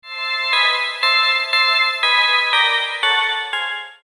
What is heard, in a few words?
Haunted House Organ Loop; Ghost Sound Effects